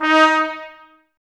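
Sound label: BRS trumpets D#; 90 s Sample CDs; Miroslav Vitous; Woodwind Brass Ensemble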